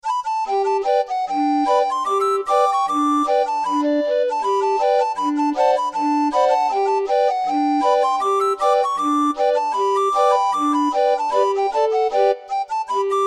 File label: Turkey in the straw, orgue barbarie